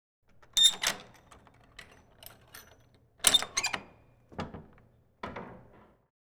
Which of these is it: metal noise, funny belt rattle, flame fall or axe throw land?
metal noise